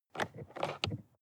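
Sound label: sports car interior compartment open; verkehr transport; autos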